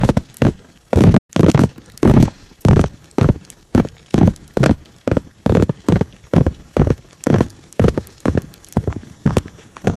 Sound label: footsteps animal calls